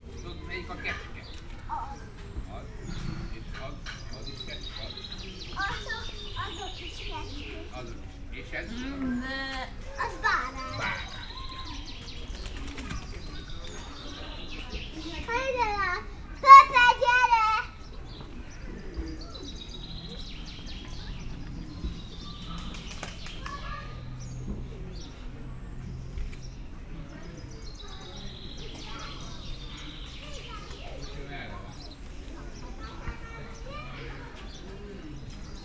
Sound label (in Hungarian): nagypapa unokával, házi kecske; szegedi zoo 2011